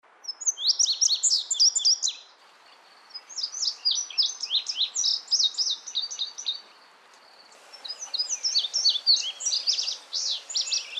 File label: indigo bunting; calls; Audubon